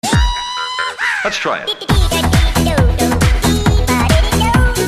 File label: yehaw